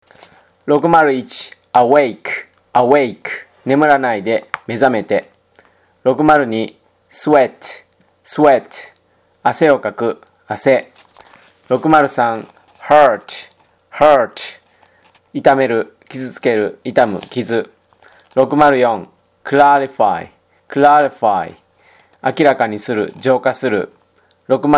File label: tango